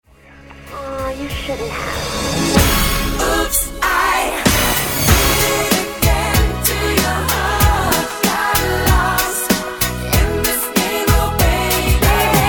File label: chorus